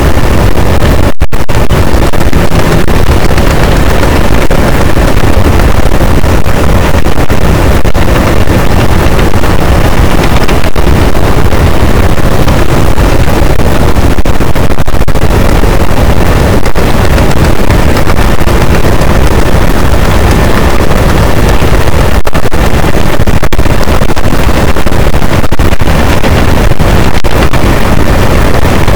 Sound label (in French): cachalot, boat; Physeter Macrocephalus